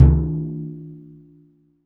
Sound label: Surdo, Perc; Akai MPC500; LA Perc